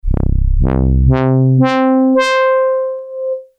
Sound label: dx21 horns; commodore amiga